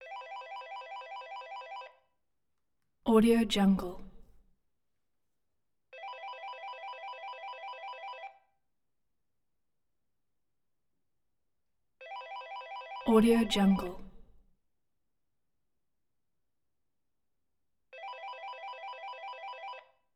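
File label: interior phone ring at t cordless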